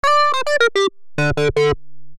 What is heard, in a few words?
Syncsolo; opxpro2; Riddle